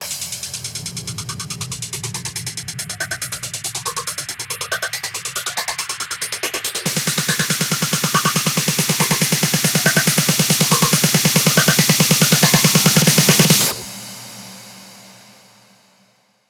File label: 140BPM 8Bars, Hands Up Trance Fills; Maestro; Vengeance Samples